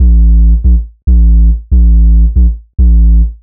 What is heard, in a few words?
140 A BASSLINE, Dubstep